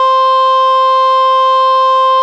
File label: C-4 CS1X TRI; AKAI S-Series CD-ROM Sound Library VOL-7